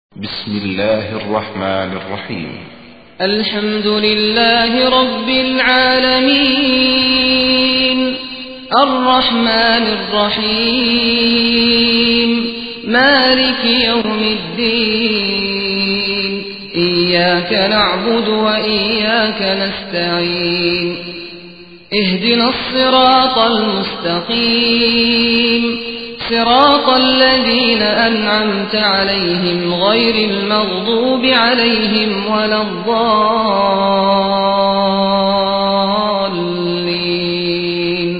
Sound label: fathiha